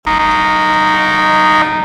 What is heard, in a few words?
horn; shoresy